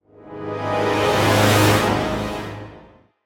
dissonant brass swell; SoundsCrate

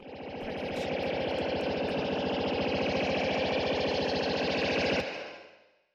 anime energy rise short

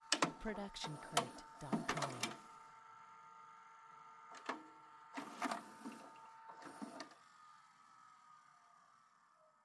VHS cassette input takeout

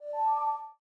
graphics application notification; soundscrate

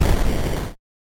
8 Bit Explosion; SoundsCrate

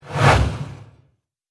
Whoosh; SoundsCrate

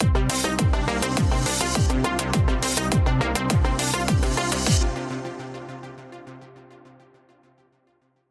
Synths and Groove Ident; SoundsCrate

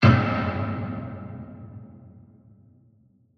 designer dark wood hit; SoundsCrate

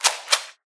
gun cocking slide; soundscrate

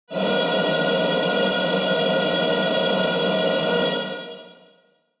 Screamy Screetchy; soundscrate

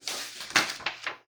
shuffling papers

